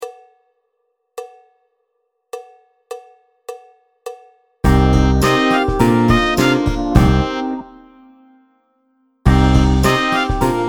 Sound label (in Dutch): Snel